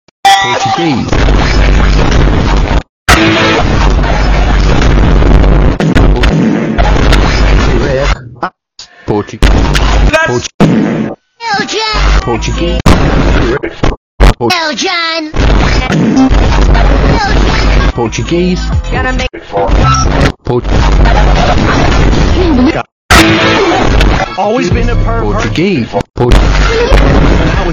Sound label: sine